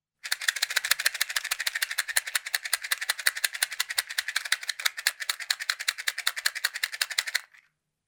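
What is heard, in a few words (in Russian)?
звук спичек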